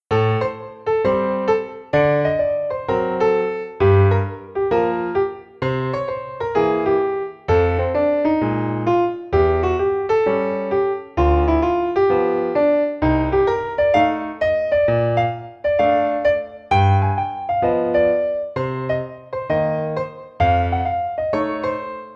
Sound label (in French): II V Modulation, Piano seul; Jazz